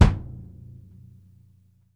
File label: AMBIENT ROO, KICK DRUM; Sampleheads; New York City Drumworks VOL-1; SP KICK DRUM